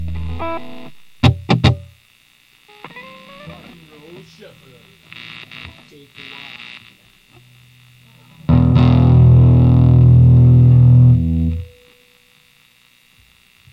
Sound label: Rock N' Roll Badass